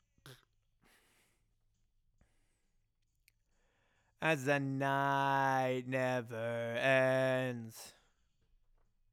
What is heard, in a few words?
Chorus